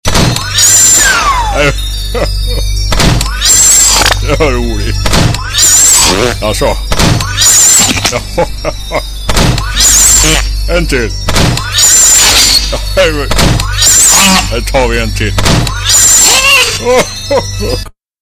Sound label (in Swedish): Ringsignal